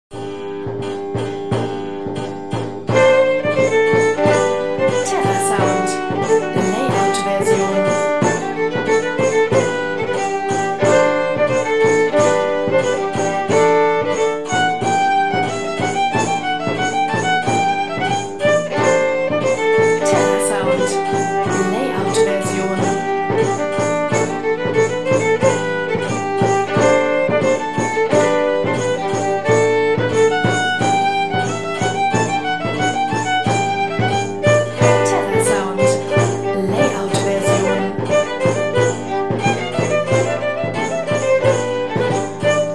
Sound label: scotch brawl; terrasound